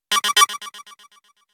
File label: strike